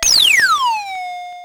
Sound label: Drum, Electro-Harmonix Spacedrum